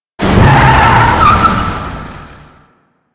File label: APC Move Stop; Enemies; Lasrian; Warframe